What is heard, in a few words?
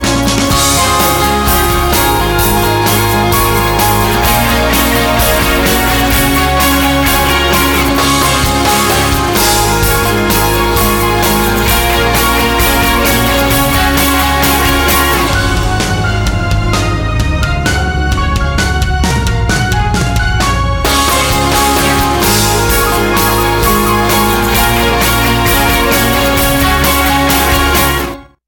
standard, ringtones; Cisco